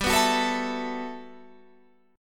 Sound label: G Major 13th; Listen to GM13 strummed; GM13 Chord